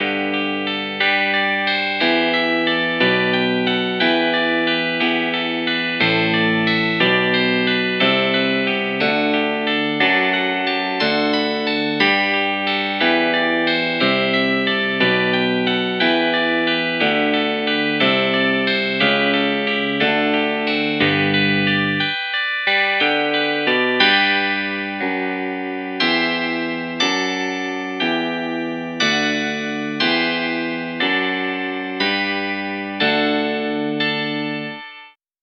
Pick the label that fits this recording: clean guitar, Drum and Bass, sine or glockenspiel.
clean guitar